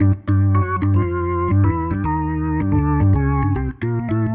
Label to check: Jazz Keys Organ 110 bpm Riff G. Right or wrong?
Right